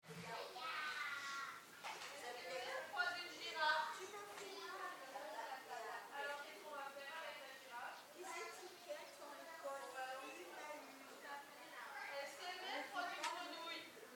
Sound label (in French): son village enfants; Peille